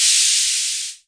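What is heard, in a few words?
cymbal, Hammond Drum Machine; DRUM MACHINES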